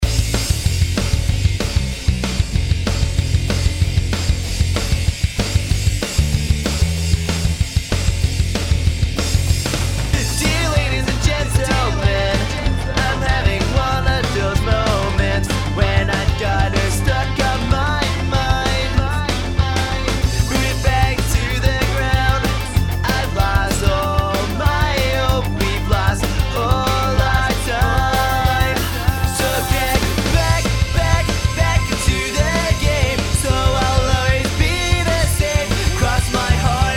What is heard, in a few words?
Punk Song Chorus